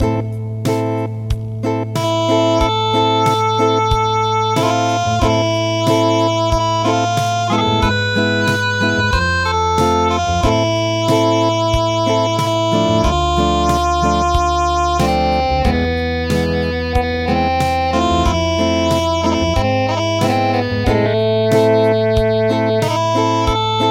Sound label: Acoustic; Bubuy bulan